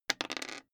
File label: die roll table hard